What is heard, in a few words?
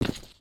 step default